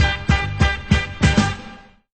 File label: horns six stabs, phonky loops